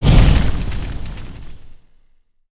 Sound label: Fire Swing; Spinjitsu